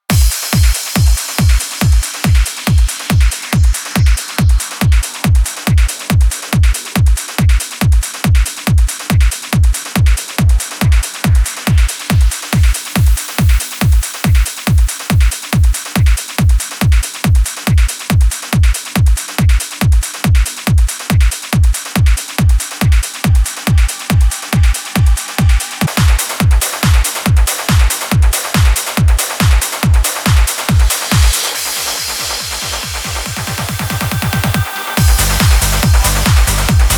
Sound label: Remix